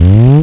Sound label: nav jeep; Desert Storm WMD Sweeper